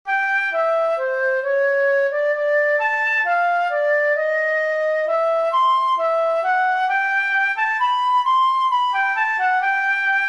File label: canons trio; martini giovanni battista